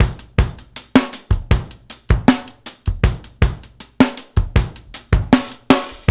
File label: LOOP 79; AMG Gota Yashiki Groove Activator